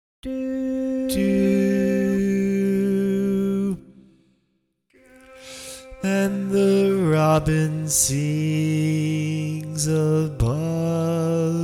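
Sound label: Baritone, barbershop tags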